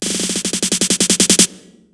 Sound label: Fill, Snare Fills; Drums